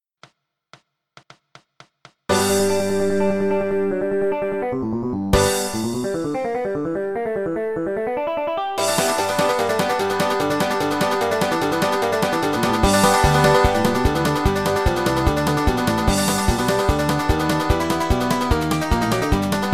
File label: Bluegrass Country Boy; backing track